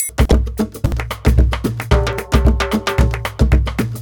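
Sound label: TRIAN, triangle, Modern Percussion Loops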